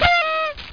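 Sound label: PARTY HORN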